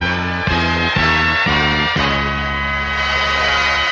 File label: horns, phonky loops